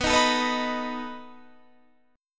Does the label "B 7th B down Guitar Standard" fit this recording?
Yes